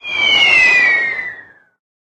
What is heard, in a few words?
fall damage indicator; video games; source engine; tf